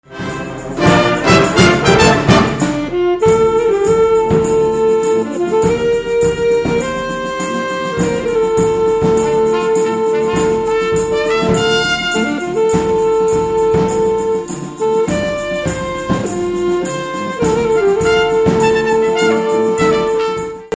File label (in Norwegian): sax trompet